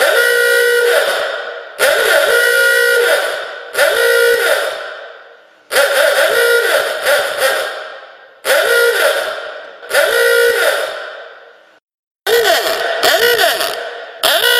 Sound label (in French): klaxon vieux tacot